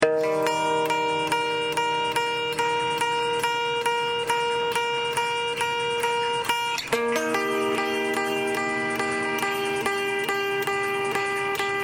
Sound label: malaria gtr